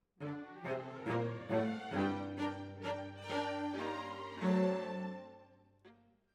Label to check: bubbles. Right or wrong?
Wrong